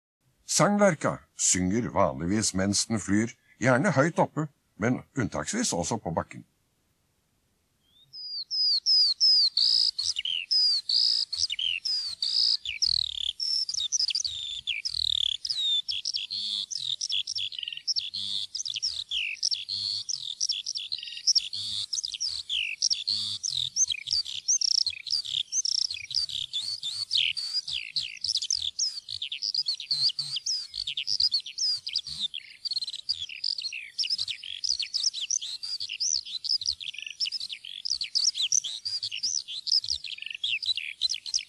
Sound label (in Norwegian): sanglerke, fuglelyder